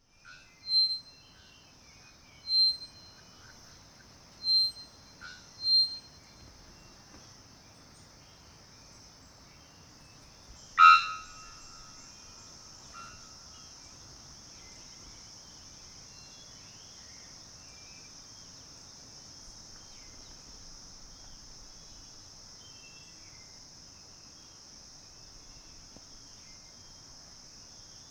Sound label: Displaying male, Birds of the Costa Rican Cloud Forest